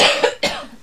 SING, CLASSIC VOX; Best Service; Hallelujah

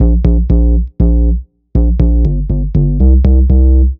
Woof Bass, Bass Foundations; Sonic Foundry (Sony Creative Software); The Electronic Music Manuscript Collection

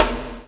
CHAIN; Spectrasonics - Backbeat

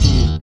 BS CHPSTK, Chapman Stick

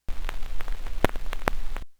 RECORD, Vinyl Scratches; E-MU Producer Series Vol. 8 – Platinum Phatt

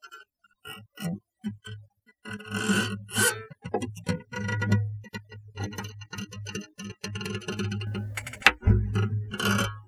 Guitar Scrape; Sonic Foundry (Sony Creative Software); Loop Noir

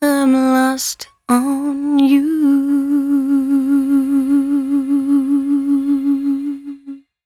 113bpm C#, Velvet Vocal; Zero-G

